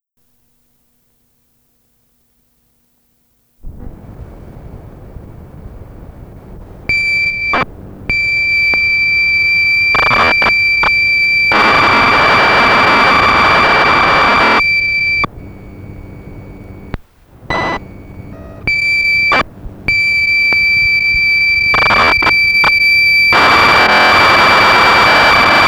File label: tape side